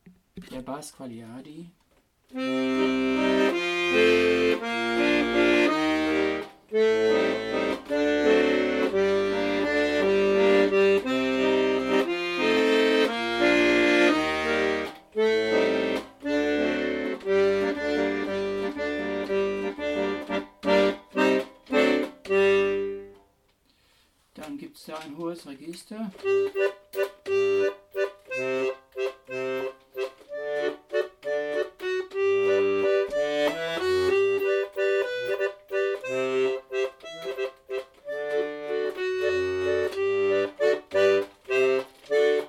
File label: bass; QUAGLIARDI